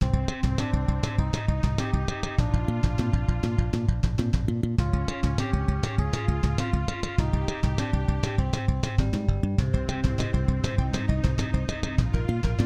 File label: intro, kawai gmega; soundcard test; Hocus Pocus